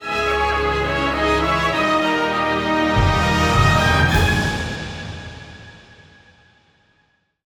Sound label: Legendary Stinger